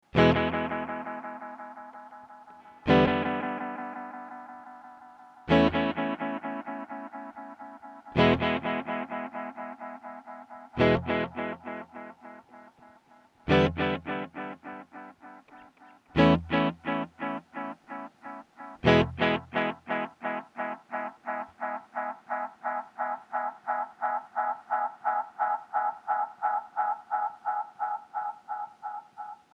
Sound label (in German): Binson Echorec E Gitarre; T-REX; REC 2 PB 1 4